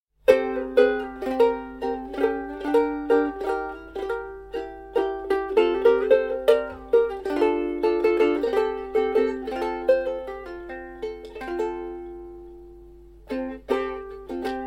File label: uke